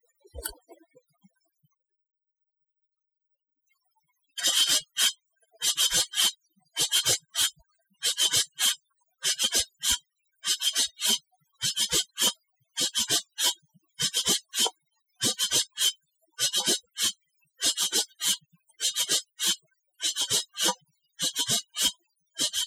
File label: Perc; Collabunity Perc Two